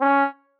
marimba trombone; ゆめ2っき

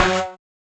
marimba steeldrum

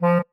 marimba penguin; ゆめ2っき

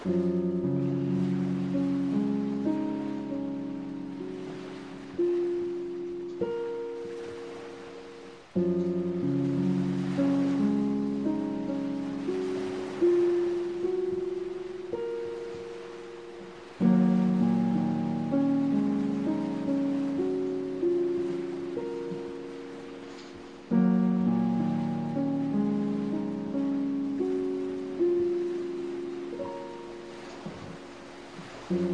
piano ocean; ゆめ2っき

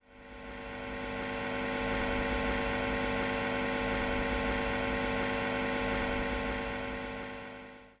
loop sfx

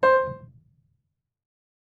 marimba piano; ゆめ2っき

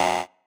marimba bike

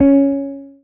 marimba guitar; ゆめ2っき

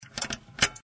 tv

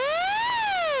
Shuffling

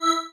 marimba spacesuit; ゆめ2っき

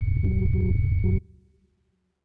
low drone; ゆめ2っき

